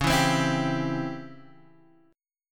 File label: C sharp Minor Major 7th Flat 5th C sharp Guitar Standard, chord; strummed; x,4,2,5,5,3